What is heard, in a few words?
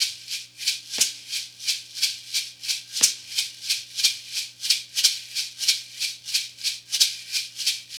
perc loop shaker 3 4 time